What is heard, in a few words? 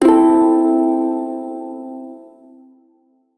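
Shawzin Pentatonic Major Chord; Warframe; Prime Shawzin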